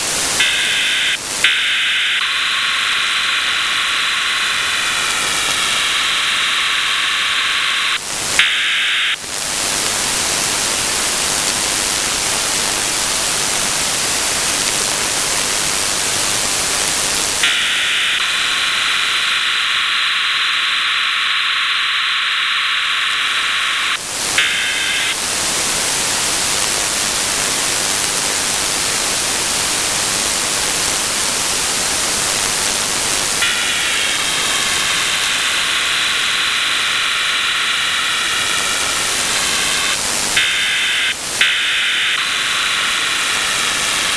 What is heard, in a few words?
CIS OFDM several gaps